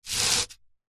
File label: foley polystyrene thin sheet slide face down on concrete; zapsplat